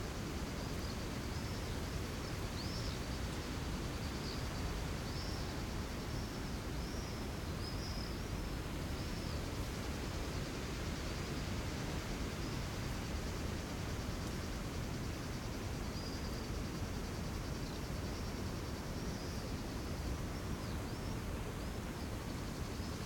lake, high wind in tree tops, birds, daytime, Crimea in summer; QUAD XY LsRs; Quad ambience of Crimea in summer; Alexander Kopeikin